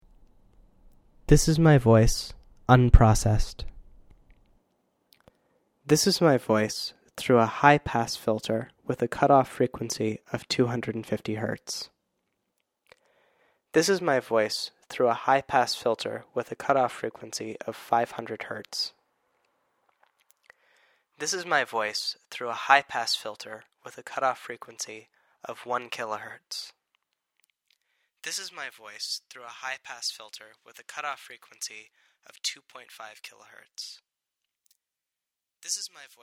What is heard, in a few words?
highpass